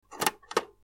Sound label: old radio button click